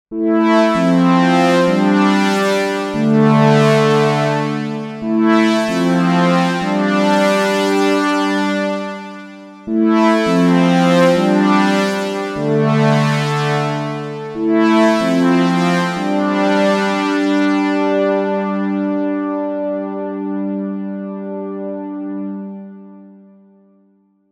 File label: Brassy; Fireball